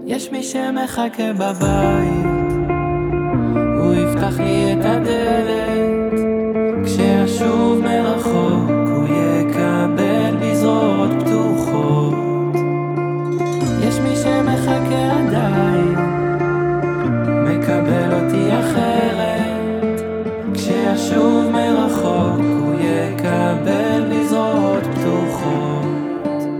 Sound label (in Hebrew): צלצול